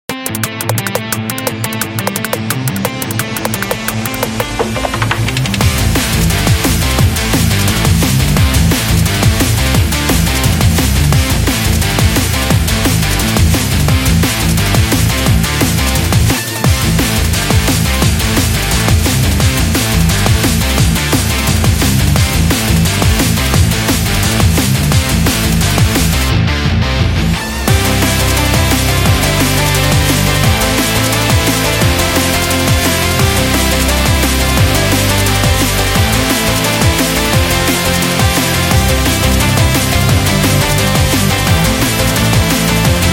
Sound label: Sports Rock